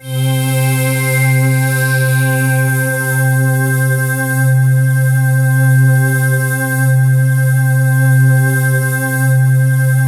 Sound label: PAD8 C4; Explosive Jungle; USB Soundscan vol.05; AKAI